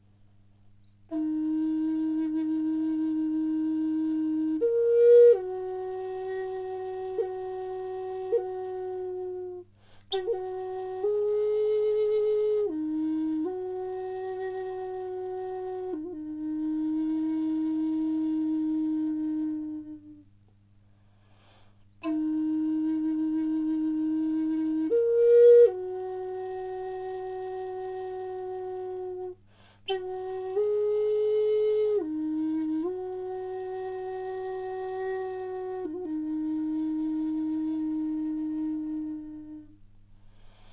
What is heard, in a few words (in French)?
Mi 432 en érable